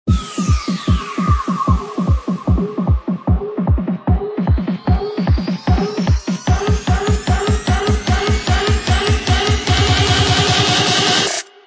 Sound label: Trap and Dubstep; Kick 2 Presets Vol. 8